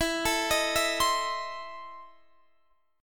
E Major 7th Suspended 4th Sharp 5th, Guitar, Open D